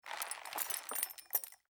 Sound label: boar pack jingle; npc; courier